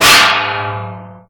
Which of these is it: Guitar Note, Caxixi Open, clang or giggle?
clang